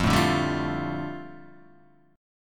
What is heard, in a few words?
F 6th Add 9th F down Guitar Open D, chord